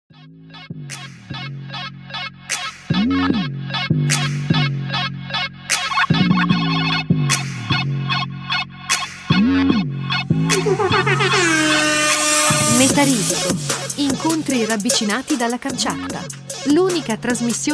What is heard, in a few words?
jingle